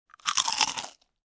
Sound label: Apple Bite